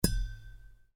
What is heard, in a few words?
UI Quirky